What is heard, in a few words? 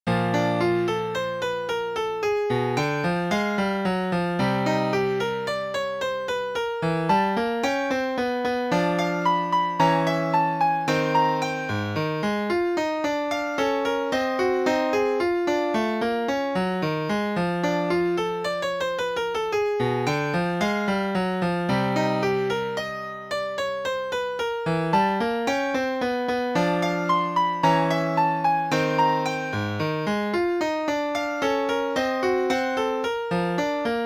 Ballad Improvisation